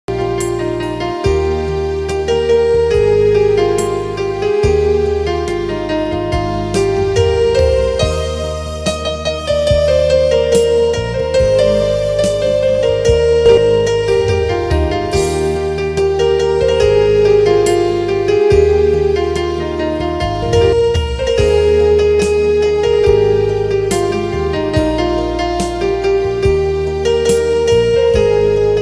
naat maula ya salli wa sallim instrumental ringtone